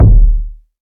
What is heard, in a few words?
boomy kickdrum sound d key